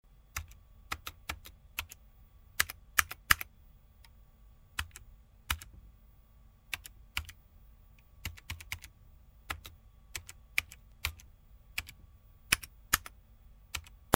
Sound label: keyboard slow, dator